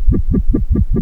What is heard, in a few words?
Korg, FX